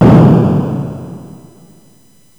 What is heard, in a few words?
explo; battles